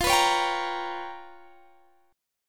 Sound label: G 7th Sharp 9th Flat 5th